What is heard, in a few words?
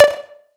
Synth Cuts; Vengeance Samples; VES1 Instruments